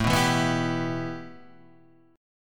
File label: A 7th Suspended 2nd; 17,14,14,16,16,14,x,x